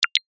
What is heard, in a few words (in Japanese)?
メッセージ